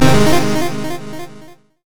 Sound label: crescendo; sona; paxsona